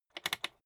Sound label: rotary control short quick turn soft